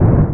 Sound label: jetpack; opensurge